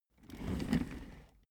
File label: office chair trundle slow short